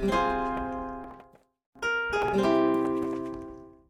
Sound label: race Win